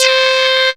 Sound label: CREEPY C4, sequencer; Best Service Alpha Dance vol.2